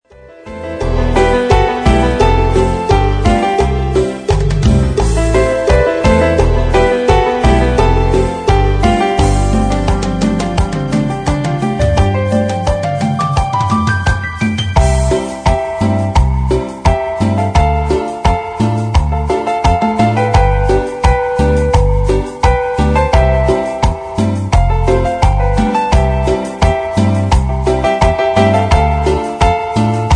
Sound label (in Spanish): Cumbia